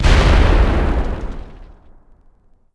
Explosion; Civ4 warlords

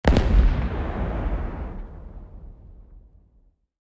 explosion far

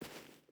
footstep barefoot sand walk; Rust

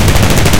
bereda-safat 77 gun loop

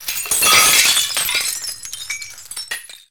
glass window pane breakout; Explosions; Call of Duty Modern Warfare

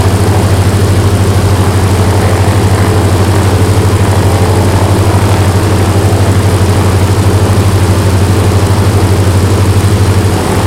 side, engines; War Thunder

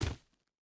footstep burlap gravel jump land; Rust

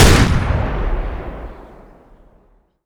Automatic Warrior, Weapons; DCS

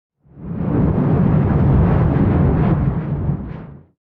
damage hits explosions, Warships

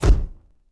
Flash Pot; Civ4

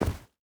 footstep burlap snow jump land; Rust

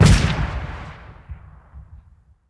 tarantula fire

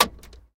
light switch

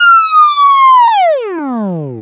vehicle police siren; Call of Duty Modern Warfare

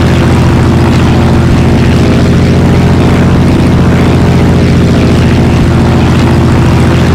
zlin rear, engines; War Thunder

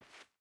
footstep barefoot cloth walk; Rust

